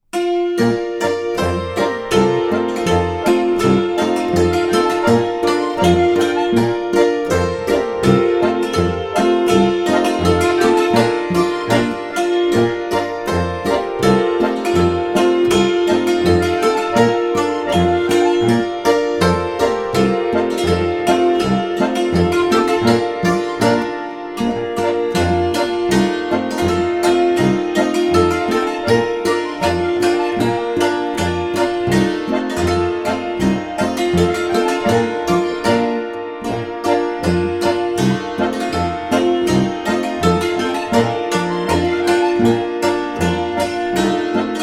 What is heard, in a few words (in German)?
Hackbrett boarischer